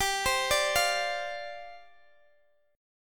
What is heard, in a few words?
G 7th Suspended 4th G, Guitar Open G; x,x,17,17,15,15; down